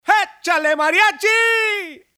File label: MARIACHI SPANISH MEXICAN